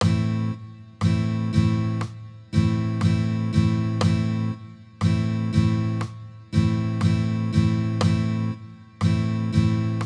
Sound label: folk strum 60; Strumming